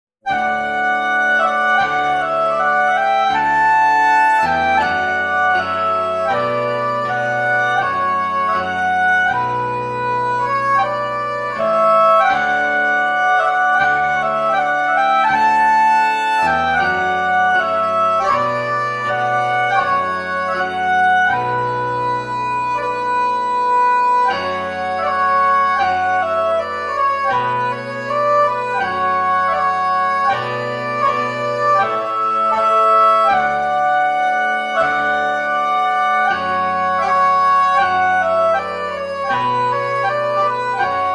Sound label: Ukrainian anthem